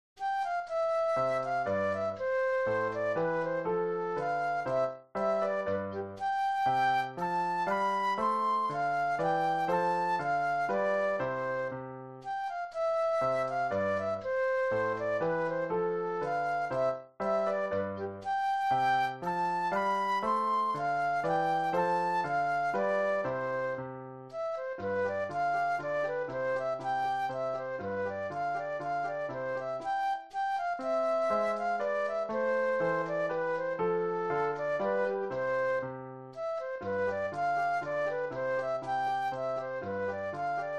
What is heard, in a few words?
German Dance Flute Solo; classical; Mozart; K. 200 no. 2